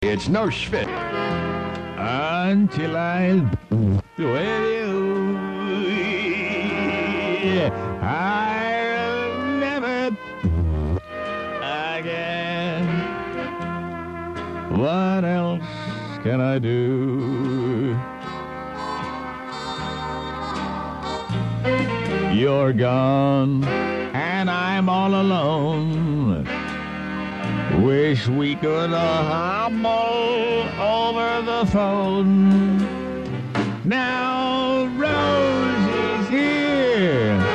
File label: Pffft song